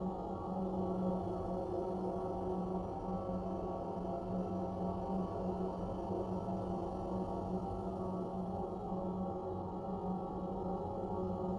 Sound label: starbase hum; nemesis; Stellaris